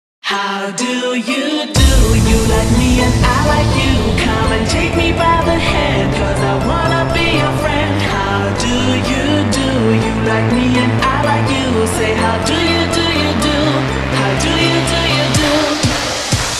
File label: remix slowed reverb